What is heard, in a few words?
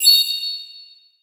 jewel appear; 祖玛